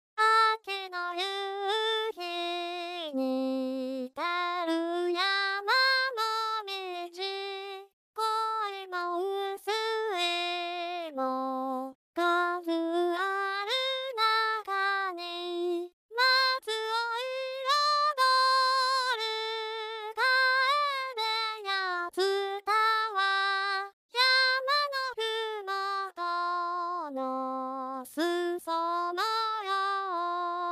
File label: Boy1 C3 D4; MEIKO